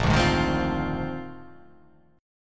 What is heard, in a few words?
A sharp 11th